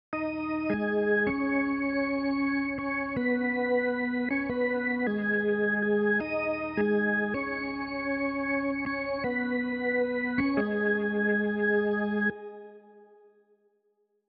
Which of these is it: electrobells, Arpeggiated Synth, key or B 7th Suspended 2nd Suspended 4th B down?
key